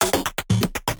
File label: glitch loop; machine tribes